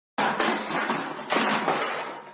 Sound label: short crash